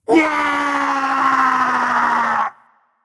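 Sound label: non verbal fear, voice; cernunnos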